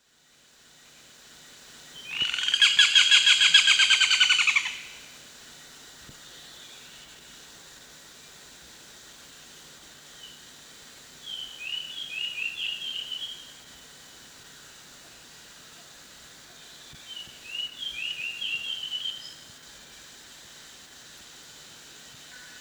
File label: olive backed woodpecker